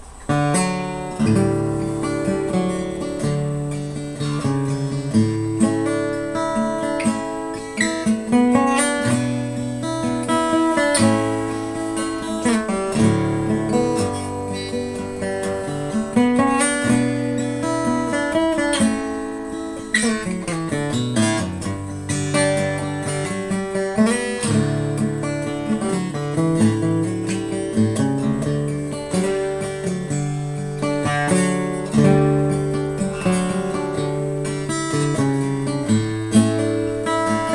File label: arcara om 18; natural